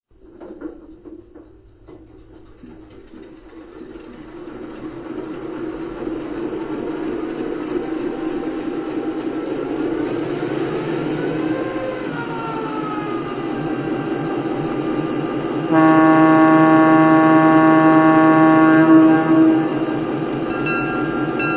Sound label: GP7, trains